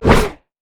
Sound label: yeti basic attack; nunu